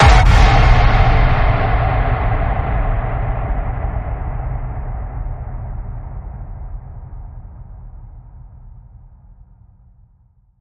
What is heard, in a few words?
Radioactivity Ping, F sharp